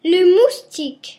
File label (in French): moustique; Les insectes